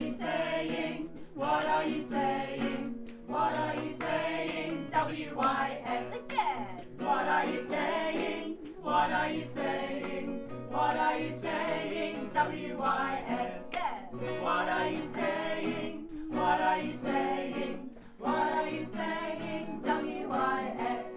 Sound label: chorus